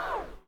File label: P-51D Cockpit Primer End; DCS